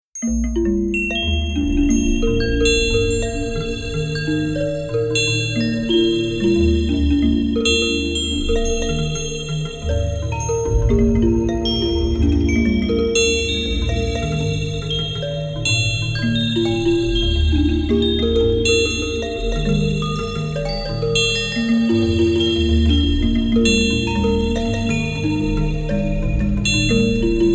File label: biotope (rain); biotope2 (rain)